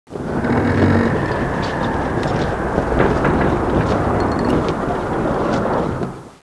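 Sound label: jet combat; MIDGARD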